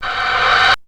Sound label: Rev Hous, dry perc; Quasimidi Rave-o-lution 309